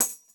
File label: tamb hit